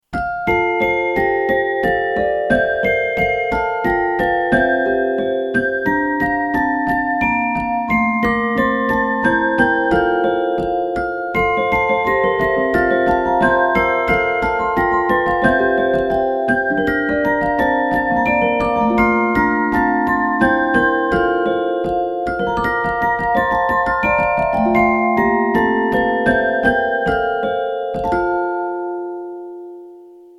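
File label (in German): Wer hat die schönsten Schäfchen, music box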